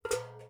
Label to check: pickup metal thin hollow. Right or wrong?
Right